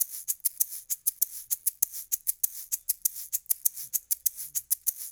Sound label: LOOP, SHAKERS, Percussion